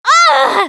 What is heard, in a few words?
hurt major, russian, Survivor Voice Producer